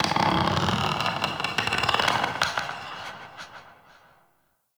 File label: Forest Distant Tree Creak; Ambience; Warframe; Grineer